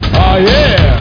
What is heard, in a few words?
UHYAH